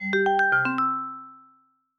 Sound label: tie Pian Qin; Smartisan OS 6.0.0; notifications